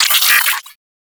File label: space drone death; Warframe; Corpus Space Drone